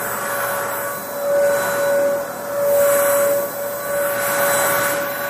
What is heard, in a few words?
Vacuum Cleaner